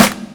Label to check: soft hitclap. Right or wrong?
Right